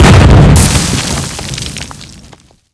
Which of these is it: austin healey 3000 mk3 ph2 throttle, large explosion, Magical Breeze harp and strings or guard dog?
large explosion